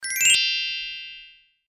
combo star; flip it

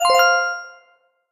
combo; merge fish

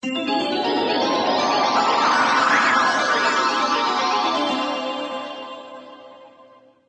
battle; dexomon2

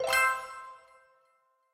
pow; zig zag line

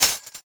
key; back home3

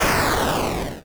explosion; tamachi jump

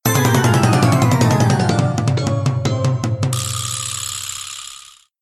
gameover; breakfast time

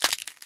arrow break; orc invasion

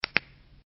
button; revolution

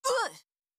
ooh oof; steam camp

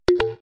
bonus; heart attack

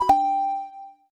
level complete; match drop

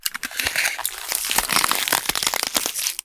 ice; slice fruit

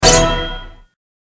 combo; slice fruit

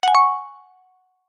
win; pool 8

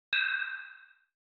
hit; blocks up

